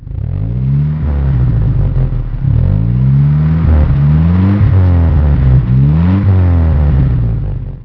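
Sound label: triumph road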